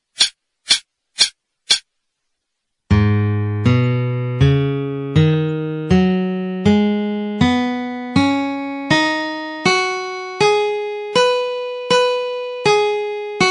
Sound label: G Sharp Minor Pentatonic, 80, guitar